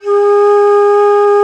alto bon, India winds; E-MU Producer Series Vol. 7 – Old World Instruments

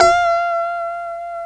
F#5 FUNK POP, STRAT, Guitars; Spectrasonics; Hans Zimmer Guitars VOL-1

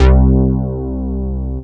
synth, bass

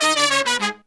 96BPM, Horns; Phantom Horns; 90 s Sample CDs; Zero-G

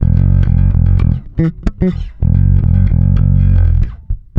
NASTY F#, bass; Spectrasonics; Bass Legends

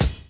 DRUM CHOP; AMG Gota Yashiki Groove Activator

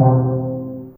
Stl Drum C3, Fairlight CMI IIe, Mallets

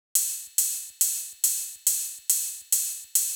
drum Loop 140BPM OH

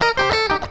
FUNKY, Grooves

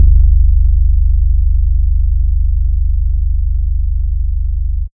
SOLID BASS, Bass Analog & Synth; USB Soundscan vol.12; AKAI

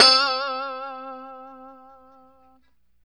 POP C 4, STRAT guitar; Spectrasonics; Hans Zimmer Guitars VOL-1; JC-STRAT POP

